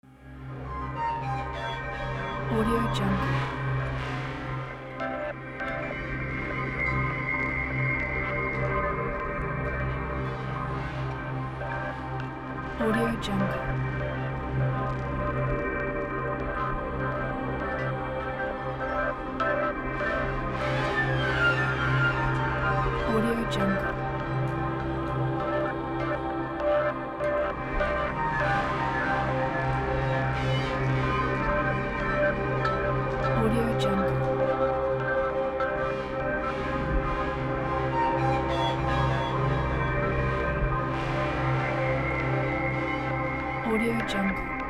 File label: tension ambient background